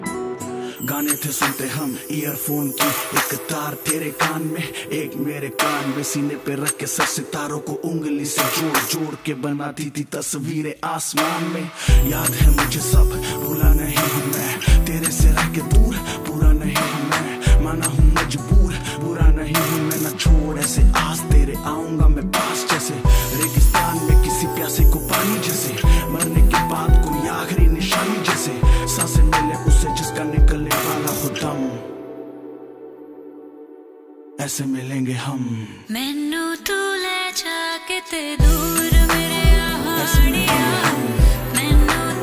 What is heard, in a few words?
New Punjabi Song